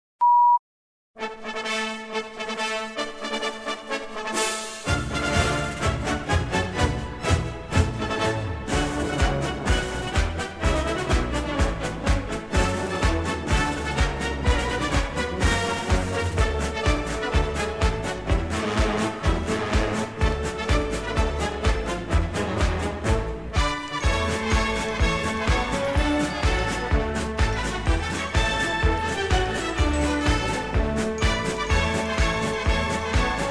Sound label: strutting solo